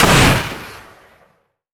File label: Aircrafts Canopy Jettison; DCS